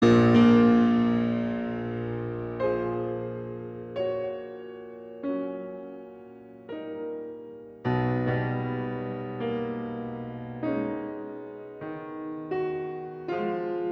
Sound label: piano sonata